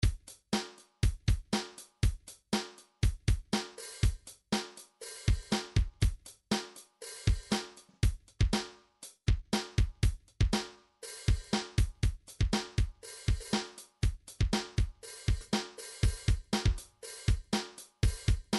midi vol 4 drums percussion

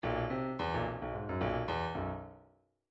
midi grooves rock

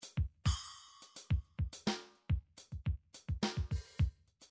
drums; weckl

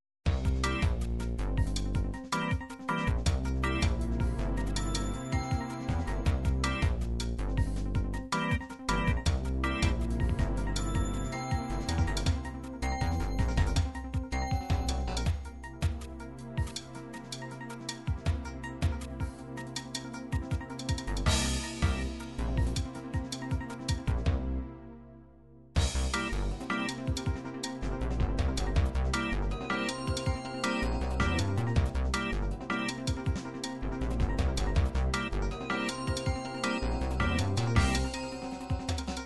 dance jungle, midi

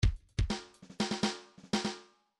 fills pop fill

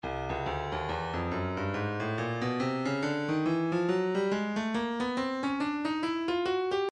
breakbeat midi 070 bpm; Lowdown A; Stylus Breakbeat Midi Files

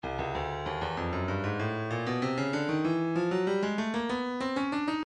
stylus breakbeat midi 096 bpm; Bopper

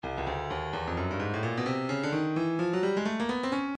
stylus breakbeat midi files 129 bpm; Stylus Breakbeat Midi Files 125 129 Bpm

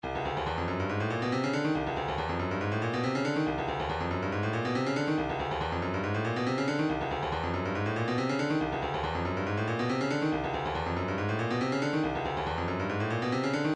140 tambourine; Rain Forest

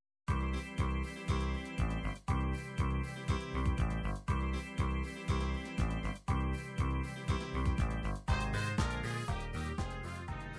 disco

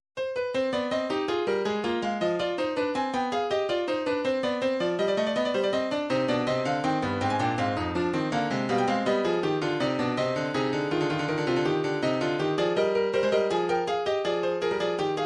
piano, midi

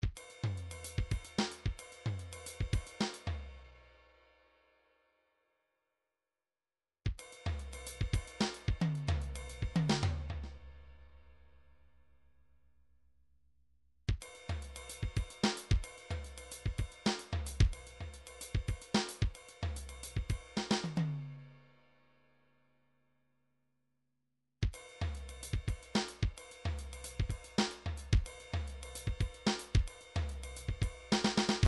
drums, midi; Bruford Midi Drums

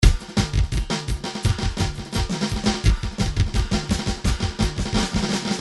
drums 170; Paul Kodish Dangerous Drums

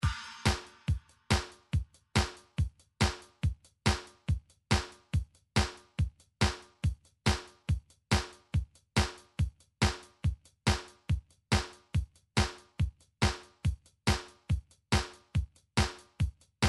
midi drums, disco

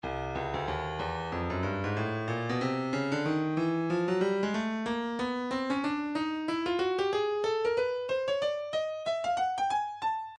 breakbeat midi 093 bpm; hipster c; Stylus Breakbeat Midi Files

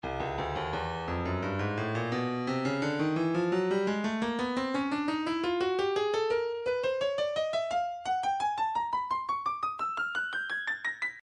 breakbeat 086 bpm; man c; Stylus Breakbeat Midi Files

